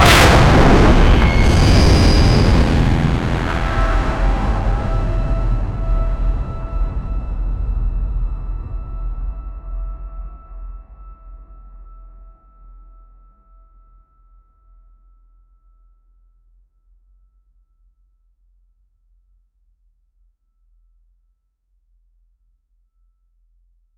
a dark powerful and utter, bass heavy

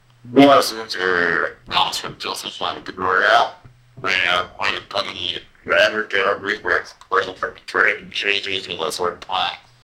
thrilling reveal moment, reveal

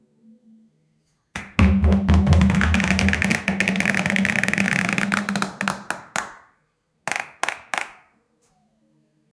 a brief approx 1 second, chair scrape

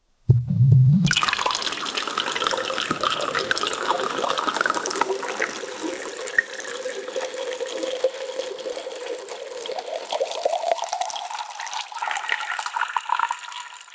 pew pew pew dj sound, electronic

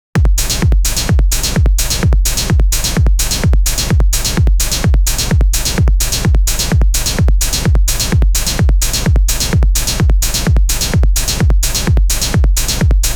techno house

